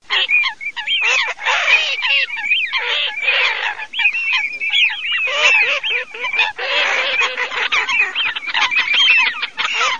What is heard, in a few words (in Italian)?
alzavola fischione folaga, birdsound